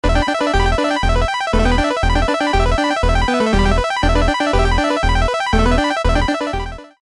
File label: retro arcade music